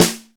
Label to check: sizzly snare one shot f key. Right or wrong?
Right